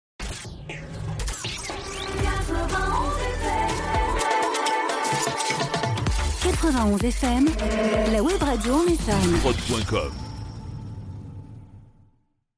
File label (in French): Jingle Chante Voix Parlee